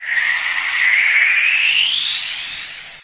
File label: reverse, FX; Vengeance Samples